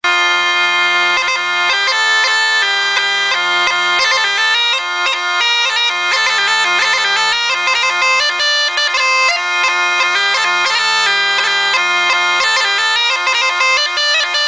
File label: slovak folk song, bagpipes